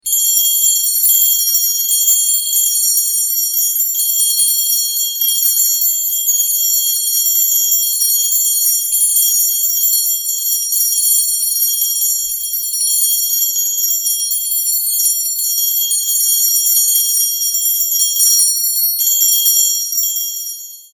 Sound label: Christmas bells ringtone